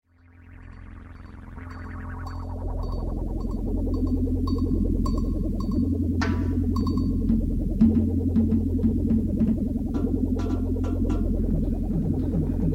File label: radio noise; Radio Bagdad – Noise 1